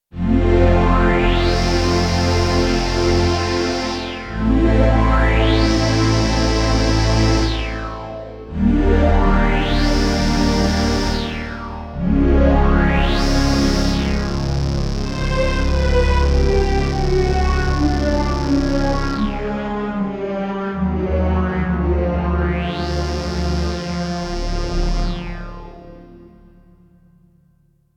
Roland D-70 Sweep Space; Factory Performances

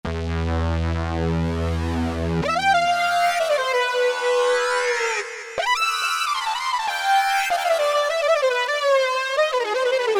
Solo Mono FXM, Roland SR-JV80-01 Pop

